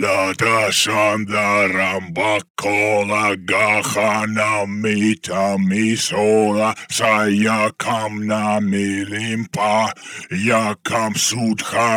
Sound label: 138 Shamanic Voice (Dry), Psytrance